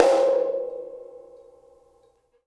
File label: Snare Shard; ZeroGravity Drum Shards